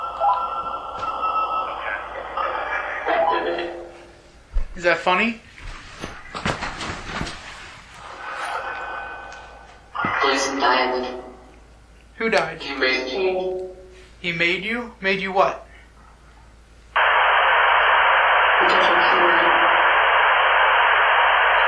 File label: Phasma Box; Canopy Room